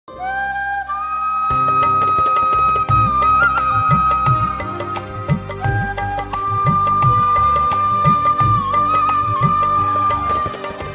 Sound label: flute ringtone bansuri ringtone hindi ringtone